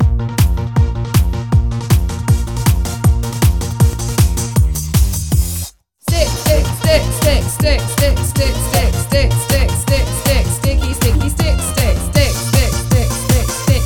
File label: STICK SONG EXAMPLE